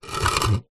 household plant pot heavy stone move slide concrete; zapsplat